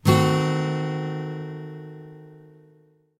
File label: Guitar strum; Phasmophobia